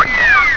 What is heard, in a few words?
WWAAA